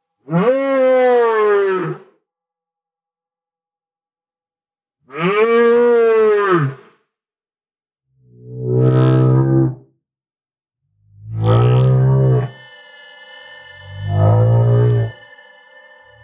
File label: stellar ocean whale